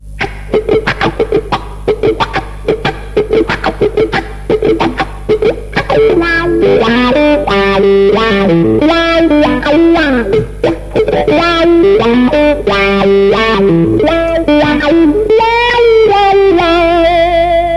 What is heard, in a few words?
wah dist; vox847